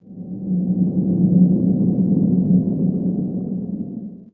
wind LOW; The Elder Scrolls III - Morrowind; Morrowind GOTY GOG

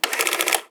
printer line; Papers Please

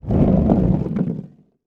Skateboard Roll On Wood; Movements; Sports; Adobe; TTRPG

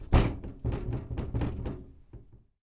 Rattling Window; SyrinScape; City gone mad

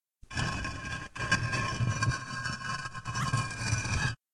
Medium Rumble Walls

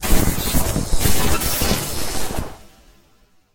Lightening spell; SyrinScape SoundsScape Collection; bbh Haunting part 1; TTRPG

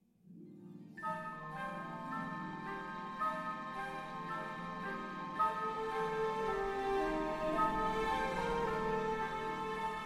no choir

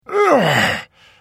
Hit, Vo

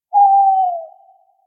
Tawny Owl; SyrinScape

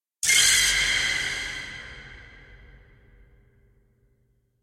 piano notes; SyrinScape; Madness in the library

